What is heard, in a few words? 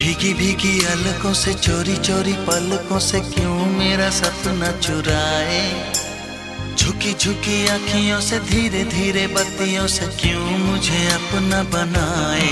emotional ringtone